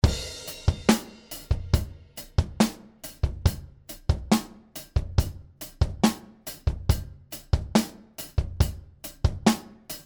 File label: Karacter Drums Original; Elysia